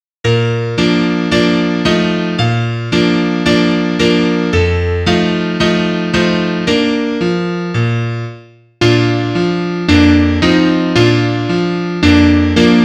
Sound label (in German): Klavier; Liederbuch; haensel und gretel